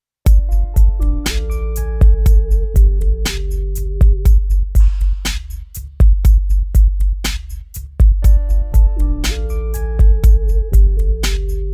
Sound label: hiphop; Multi-patch demo 1; xp-80; roland demo cd